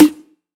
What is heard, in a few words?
Perc; Sample Banks; Percussion